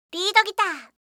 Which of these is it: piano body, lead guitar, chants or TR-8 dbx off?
lead guitar